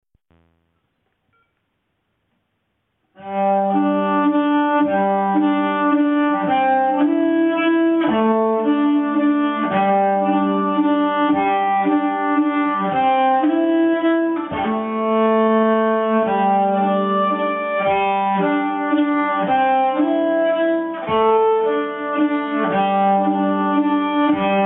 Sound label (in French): Valse; Valse Pastouriaux Acc